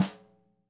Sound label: Ludwig Supraphonic Snare pp Room; Sonic Foundry (Sony Creative Software) - Vital Drums